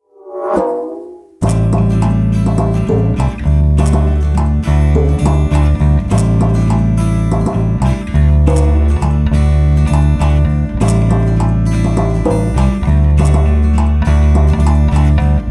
funky watering can

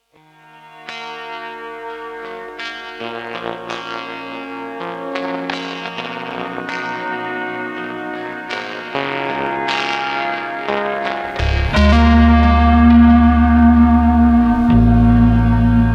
blocking distortion; helios; effectrode

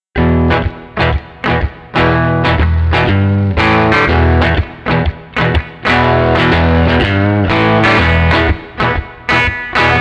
blues drive

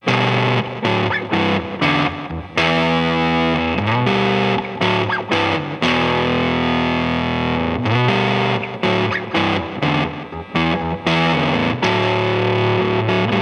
mercury Neck PU, mercury fuzz sounds; LP Headstrong

